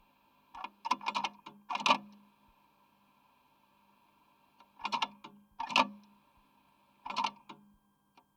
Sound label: Studer A80 play stop 15 IPS mono contact mic, analog tape machine; Studer A80 Master recorder analog tape machine; Ivo Vicic